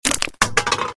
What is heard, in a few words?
sound delete; samsung d900i; Resman55Pro patcher